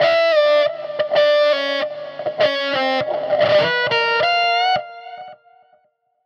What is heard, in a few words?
Guitar Line, 100bpm, hiphop; musicradar